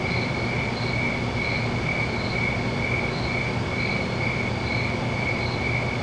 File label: desert